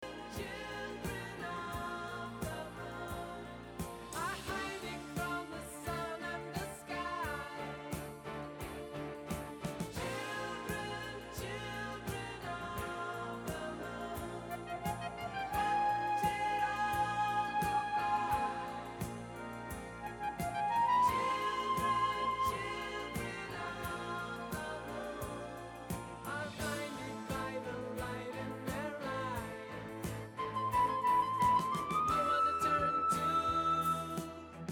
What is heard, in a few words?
Flute